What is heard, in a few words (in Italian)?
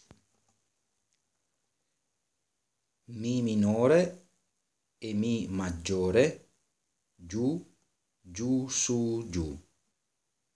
ritmo mi minore e mi magg